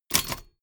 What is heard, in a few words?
typewriter